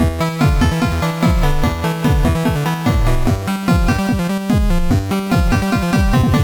synth song; synthsong3; MED; Voyager

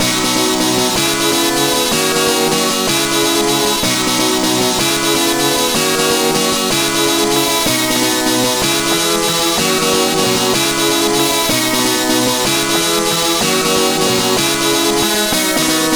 Guitars; MusicMaker V8

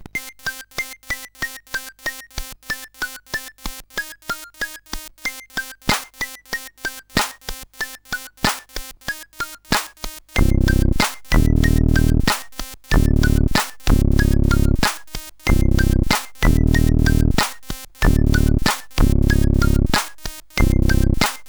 Rap

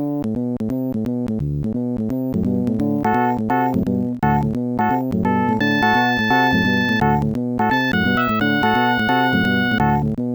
modem; The Music Studio

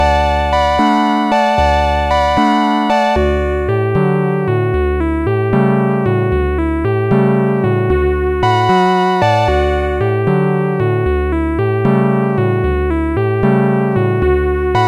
barcarolle; Sonix Music Driver

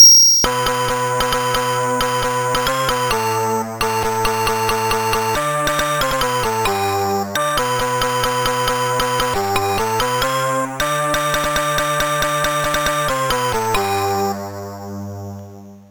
Dustman, Music Box

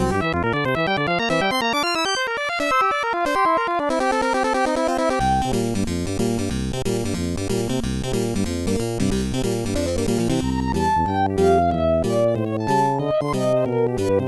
jukebox song; The Music Studio

